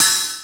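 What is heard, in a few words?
hi-hat hit, roots reggae; Sounds Good - Roots Reggae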